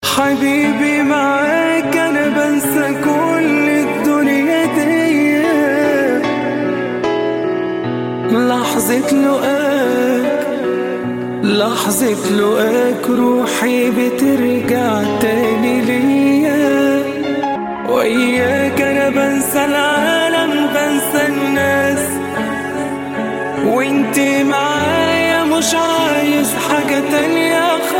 love arabic ringtone

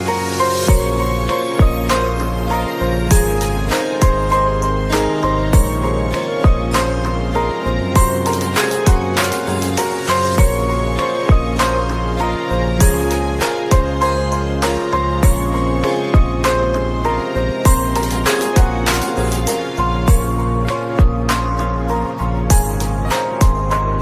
best bollywood music ringtone

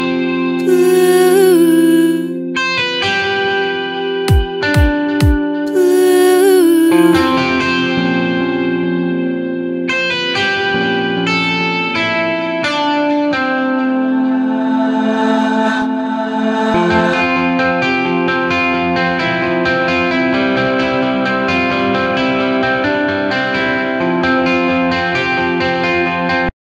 bollywood song ringtone

